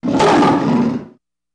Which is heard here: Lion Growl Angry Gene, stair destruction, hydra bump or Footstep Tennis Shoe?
Lion Growl Angry Gene